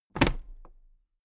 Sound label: toolbox place upright regular